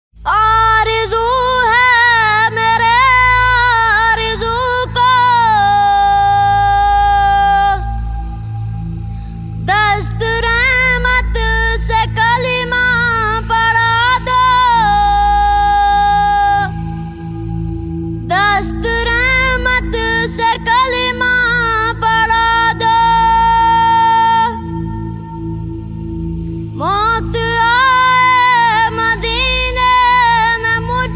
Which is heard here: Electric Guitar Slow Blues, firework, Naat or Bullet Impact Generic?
Naat